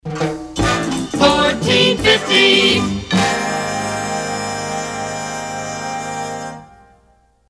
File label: Time Chime; WVON; (last half of logo)